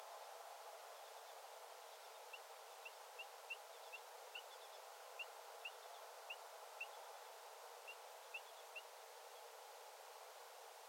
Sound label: Rufous chested dotterel